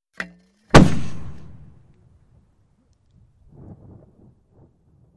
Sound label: German 88cm Mortar Ha, mortars